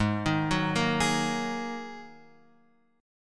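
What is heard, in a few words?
A flat 7th Suspended 2nd A flat Guitar Open F, chord; Ab7sus2 arpeggiated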